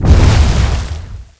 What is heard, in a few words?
soil water